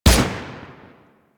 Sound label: Emplacement Shot; Grineer